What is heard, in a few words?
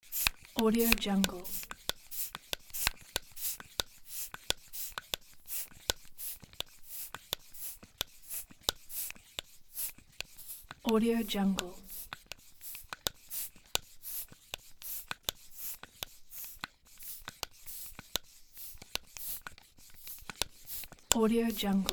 bicycle pump; spacelabstudio